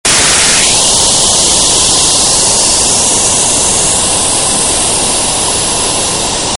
filter; maxmsp

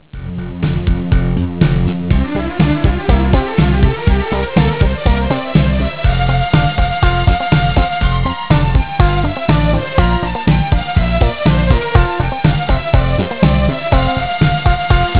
happy birthday chorus

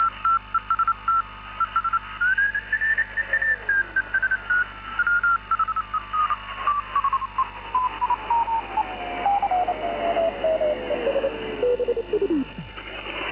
ten tec orion panoramic audio

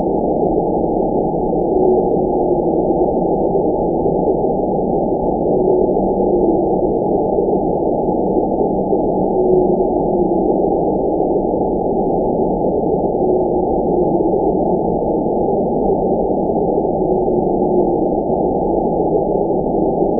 whale blue atlantic nord; cornell

